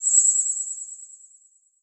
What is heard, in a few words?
Title Transition Delay Short